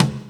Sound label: Dance Drums; Best Service ProSamples vol.15; AKAI